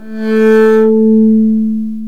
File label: STR, Cb Harmonics; Roland L-CD702; Cb Bowed